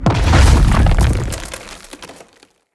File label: Knight Spawn Burst; Enemy; Characters; Abilities; Mendicant; Mnd King